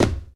glass land; Golden Crayon Sounds

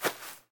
grass step; Golden Crayon Sounds

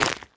ice step; Golden Crayon Sounds

